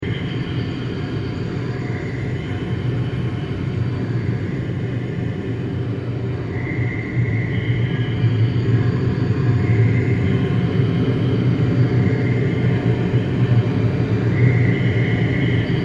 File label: Space Ambience With Alien Noises 120bpm